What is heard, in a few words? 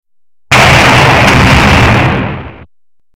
explosion